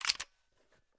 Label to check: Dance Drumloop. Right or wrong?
Wrong